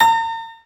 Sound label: Beat; Beat Sketcher; PS3